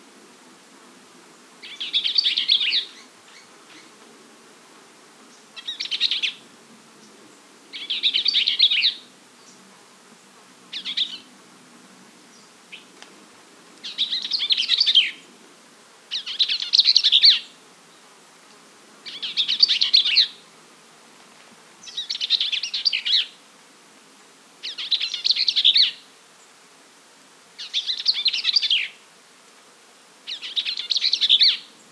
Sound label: Bells Vireo Vireo bellii arizonae